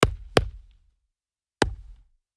wooden bat tapping home plate

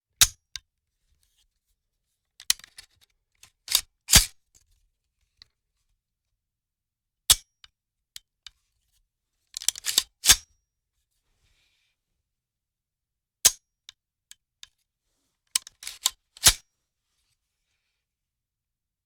rifle charging handle dry fire

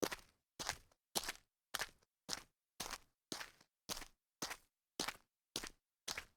walk on light grit, foley

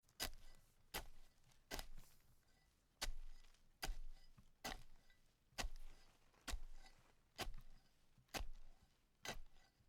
shovel into dirt

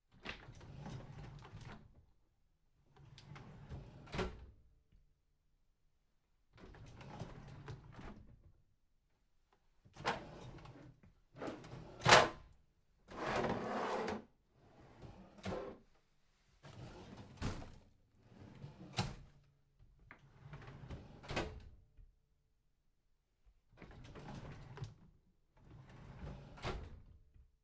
drawer rolling in out

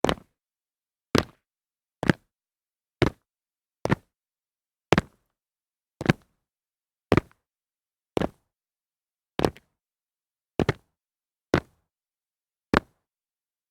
jump on concrete

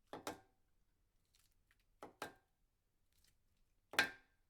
soap pickup, foley

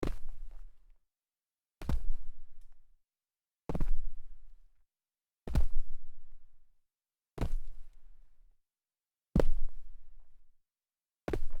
sneaker small jump dirt, foley

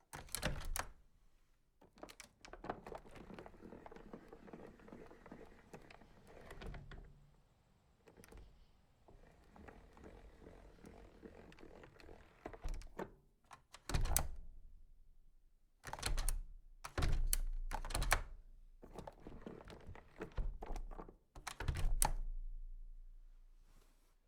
wood scraps shuffling

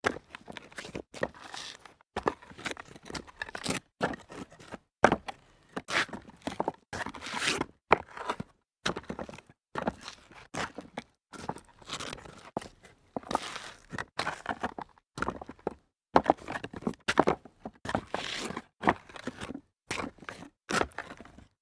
sneaker on rock debris series, foley

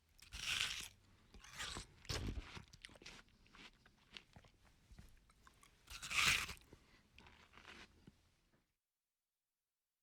human eating watermelon

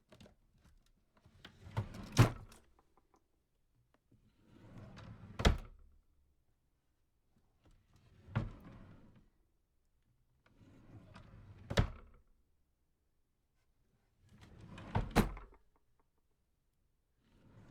small drawer open close

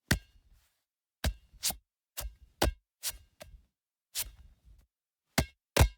basketball pass and handle

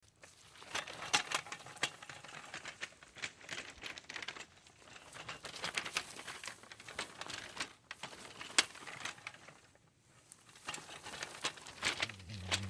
wheeling a cart

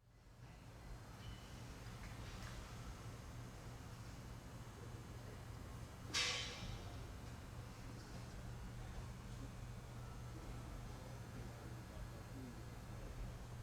factory morning start up

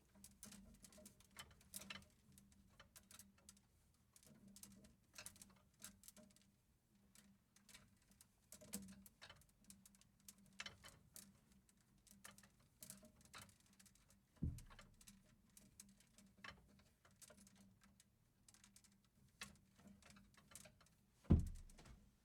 plastic hanger swinging

